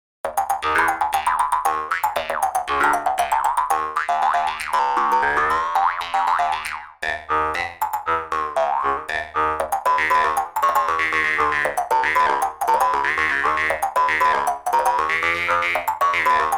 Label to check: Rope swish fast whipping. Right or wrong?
Wrong